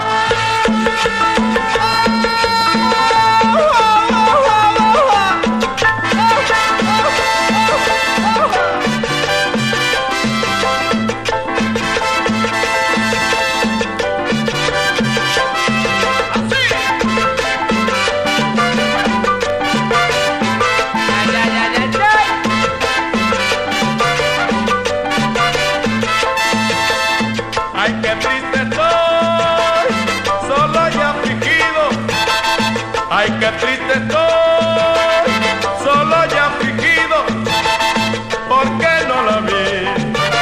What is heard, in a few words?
CUMBIA